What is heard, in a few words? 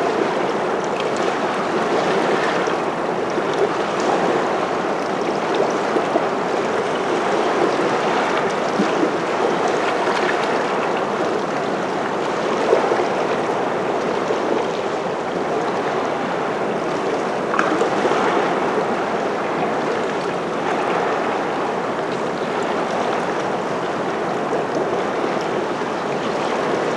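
ambience lagoon water lap shore; Titanfall 2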